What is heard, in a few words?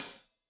Hat Loose, Hi-Hat, Cymbals Stereo